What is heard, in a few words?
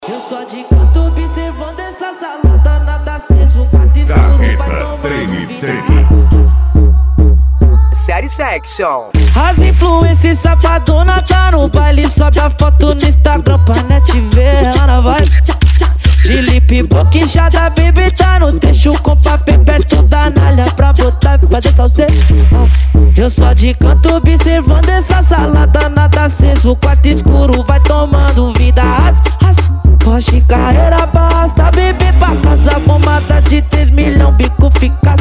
funk mega bass